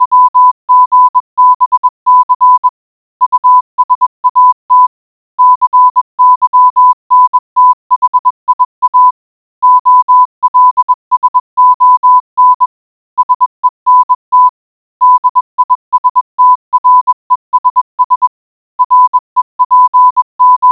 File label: morse code